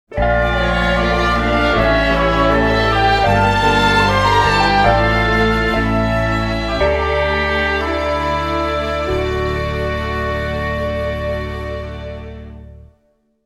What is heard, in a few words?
Defeat; SAGA Emerald Beyond